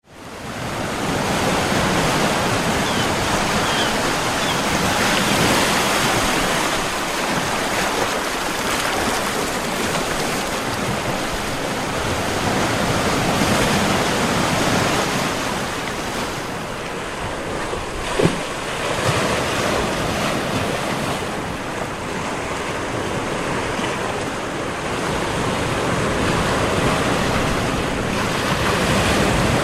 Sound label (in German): Meeresrauschen; 45 Minuten sanftes Meeres